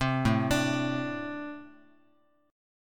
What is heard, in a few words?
A-Minor Double Flat 5th A Guitar; arpeggiated; JGuitar